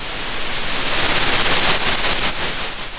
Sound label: Sound of waves crashing on a shore